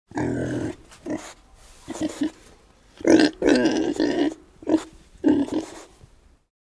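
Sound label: svinya golos, pig voice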